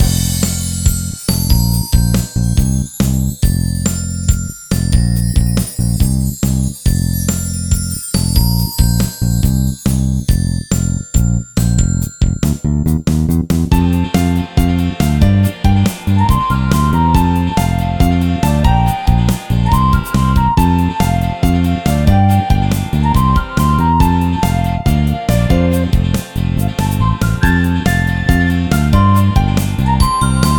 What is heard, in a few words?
backing music